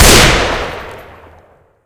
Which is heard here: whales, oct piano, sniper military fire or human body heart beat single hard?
sniper military fire